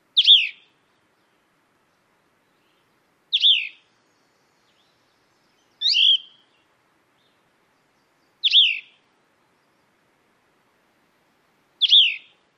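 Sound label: song, Cassin's Vireo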